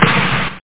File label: pop; WEAPONS